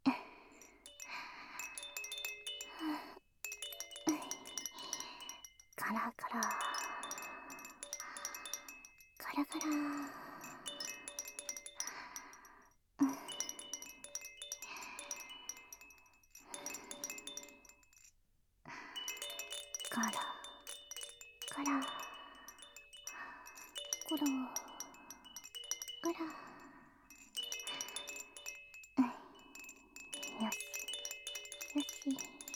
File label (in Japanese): ガラガラ（正面）; ASMR; えろまま